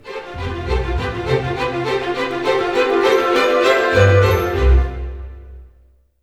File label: BIKE; East West; Scoring Tools; ORC; Bike Ride